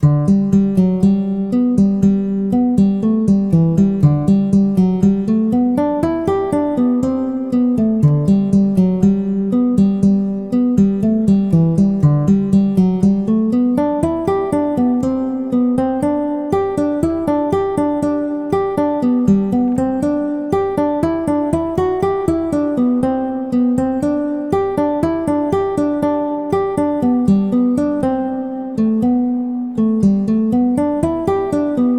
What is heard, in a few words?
Redhaired Lass easy Melody